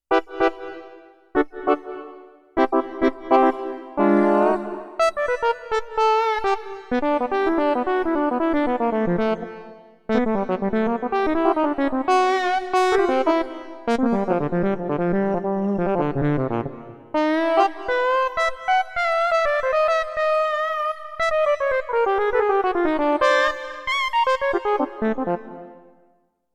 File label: Zawco Brass, Roland VC-1